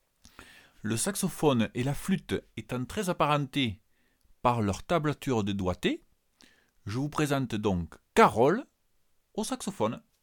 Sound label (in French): au saxo